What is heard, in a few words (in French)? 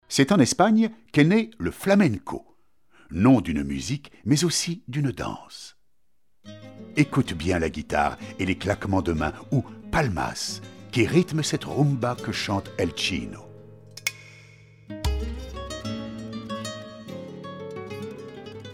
musique espagnole tanguillos rumba